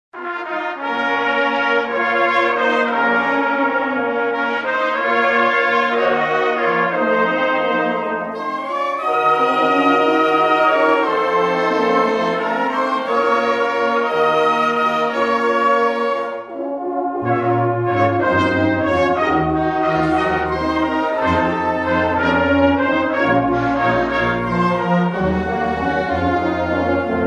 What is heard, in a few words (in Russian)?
Духовой оркестр